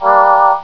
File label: me hit slow; SpaceInvader! 1.04